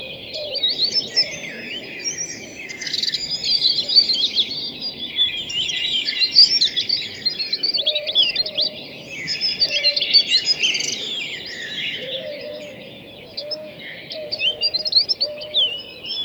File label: the dawn chorus of a, birds singing